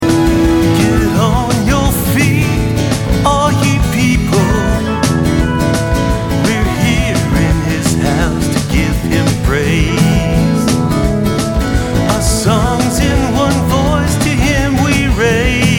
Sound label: call